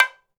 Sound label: Bongo Hi, Perc; LA Perc; Akai MPC-500